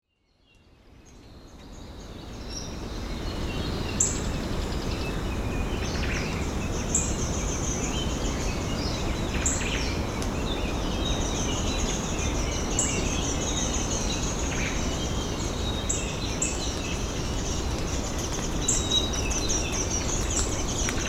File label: Forest Wind Nature Sound